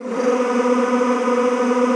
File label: B2, Extended Classical Choir; Best Service